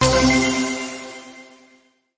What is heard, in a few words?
is Winner Fanfare